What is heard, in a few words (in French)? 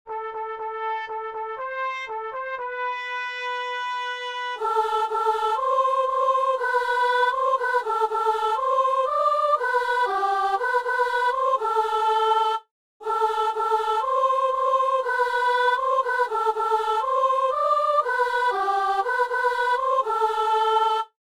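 chantre et soprano assemblée; Messe de Saint-Paul - Alleluia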